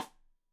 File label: Side Stick Stereo; Deeply Sampled Drums